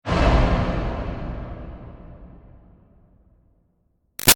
Boom Block Low Reverb; Low Impacts

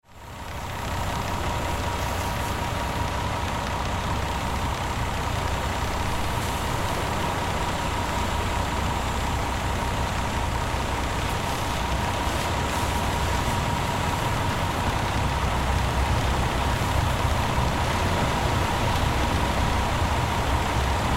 Cleaning Truck Spray Hose Engine Running; Spraying; Industry

